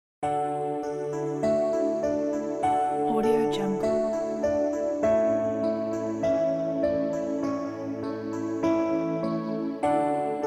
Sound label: children lullaby